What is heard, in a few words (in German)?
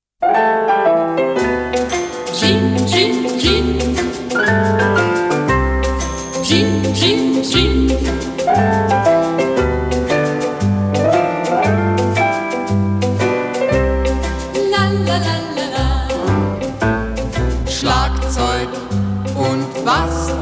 Schlagzeug Und Bass Und Klavier